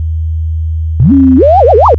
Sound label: input, noise, 2 tones and hum; dvb